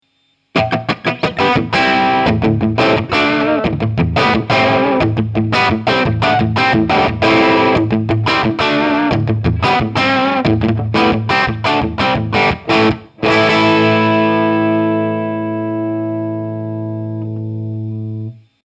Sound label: Electro Harmonix 5MM Full Throttle